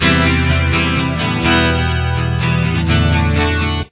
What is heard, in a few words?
chorus, guitar